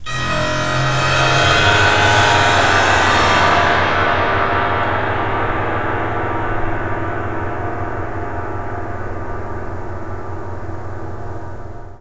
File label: GLISS R, Piano; InVision Interactive; The Piano